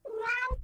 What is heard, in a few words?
animals cat meow; mono; 96kHz